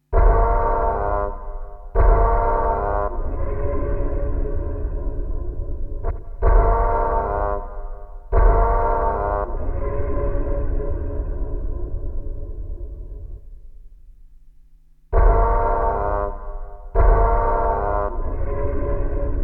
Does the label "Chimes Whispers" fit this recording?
No